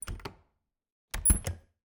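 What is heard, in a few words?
Refrigerator, old fashioned, wooden, steel, brass clasps, hinges, open close, squeak, piercing, abrupt; Eiravaein Works; Vaeyan IV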